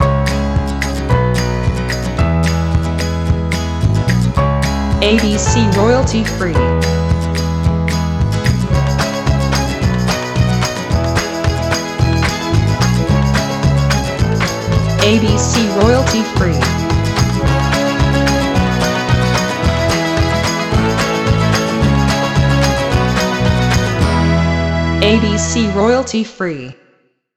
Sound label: Celtic Folk short Lo-Fi